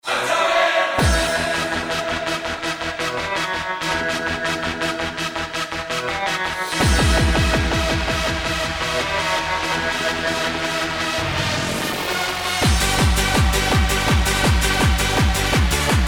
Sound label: Atomic BEAT